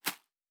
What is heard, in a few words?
Leaf Run Surface, Footsteps